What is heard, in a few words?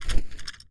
Keyboard Equip, Melee; zps; Weapons